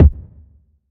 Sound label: puffy kick drum single shot d key